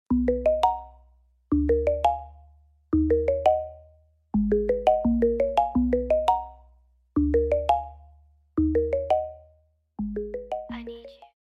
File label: aesthetic ringtone